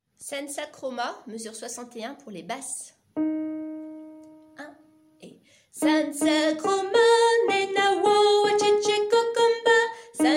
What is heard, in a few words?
Sansa kroma bass